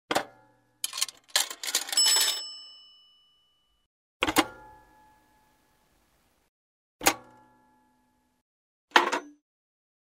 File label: Payphone goes up, hangs up, coins; money